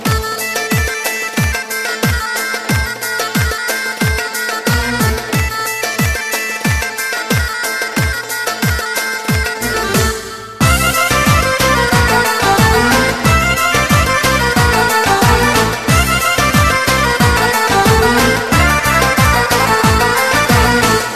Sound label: Balkan Turbo Folk